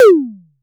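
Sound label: TOM2 ELEC, electronic tom; Crypton - DrumBase5000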